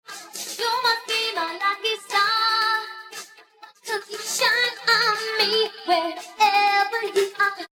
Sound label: vocals